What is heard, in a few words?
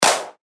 Clap; ReAnimen; revealed claps snares vol 2